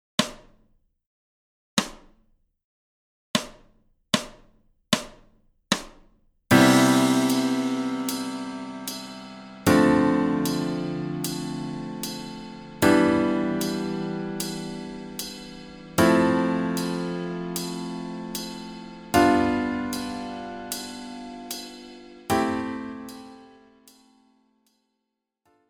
stufen in dur

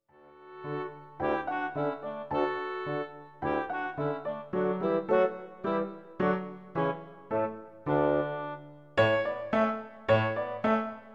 musette

